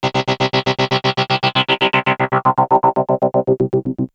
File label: GTR, Funk Guitar; 90 s Sample CDs; Zero G